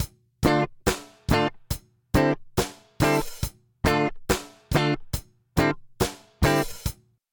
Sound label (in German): WA76 Gitarre In12 Out0 Att1 Rel1 Rat4